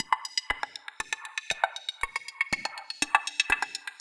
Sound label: Rhythmic (120BPM); musicradar